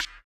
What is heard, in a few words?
BUZZER; Spectrasonics; Metamorphosis